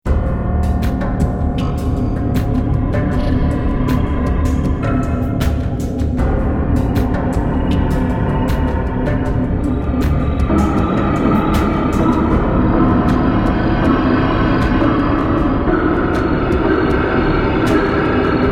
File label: Countdown; ExploringSpace; “Rise of the Nazi Machine”